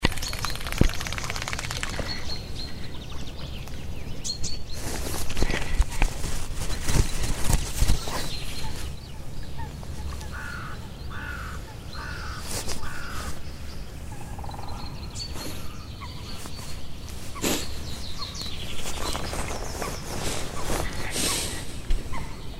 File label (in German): seegeraeusche